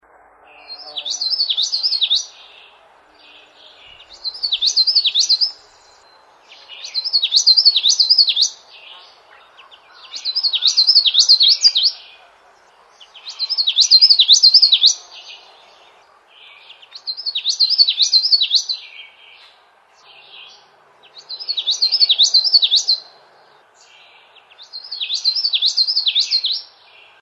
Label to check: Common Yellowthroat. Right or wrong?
Right